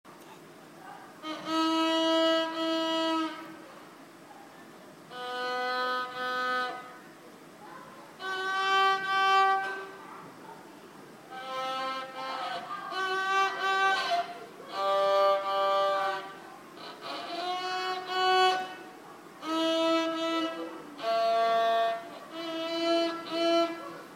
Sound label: playing her violin